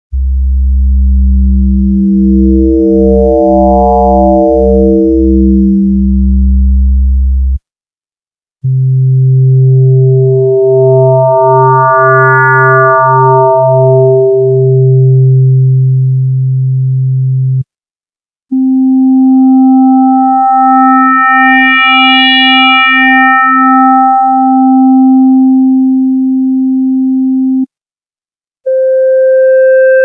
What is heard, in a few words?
hohner hs-2 e sounds, sin to sin phase distortion